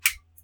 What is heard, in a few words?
Turn Light On